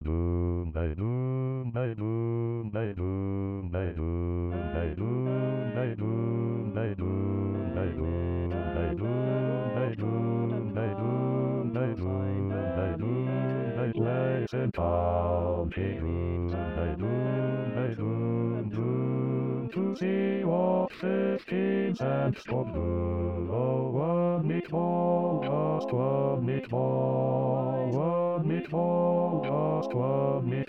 Bass All Parts 120bpm

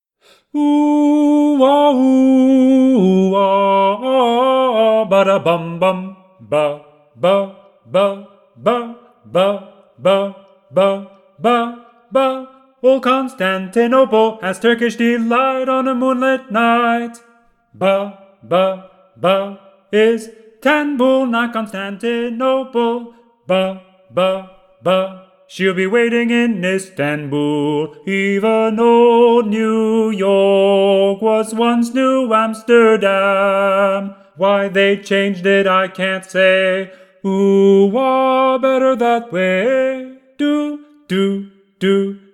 Baritone solo, ttbb

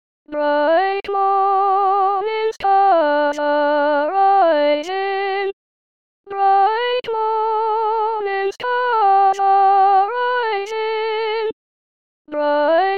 ssa Sop

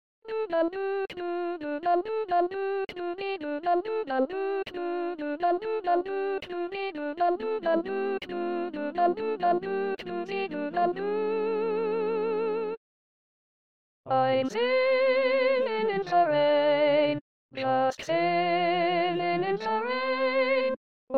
Soprano SATB

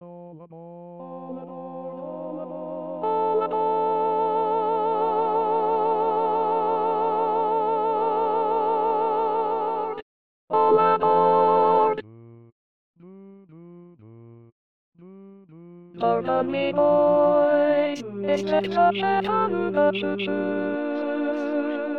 SATB alto

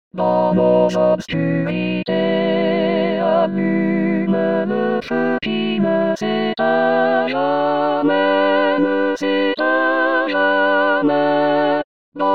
SATB all

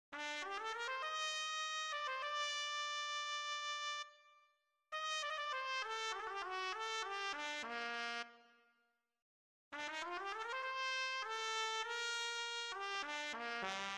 trumpet only

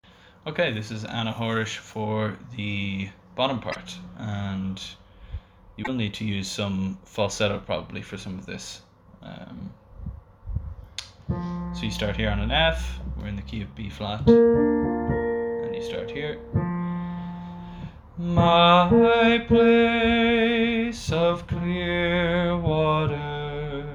low part

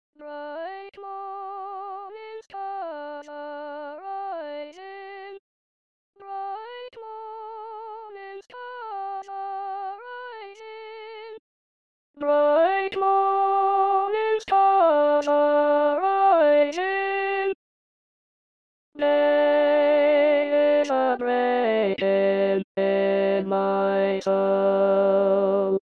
ssa Alto

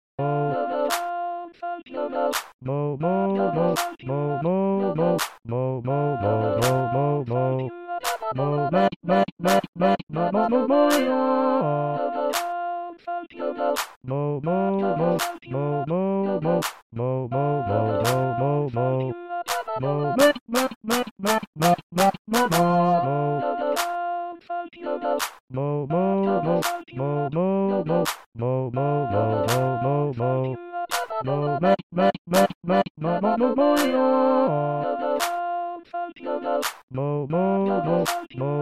bass last 15 bars slowed down

